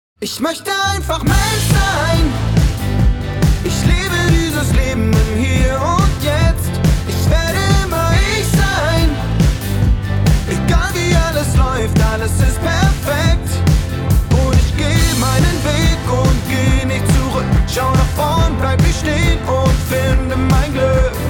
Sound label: brandsong ringtone; barmenia